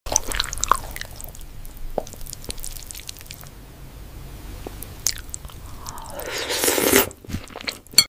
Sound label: Edible noodles